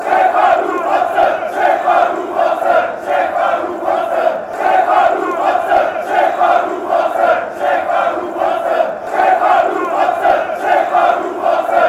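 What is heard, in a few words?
blue white hertha, football chants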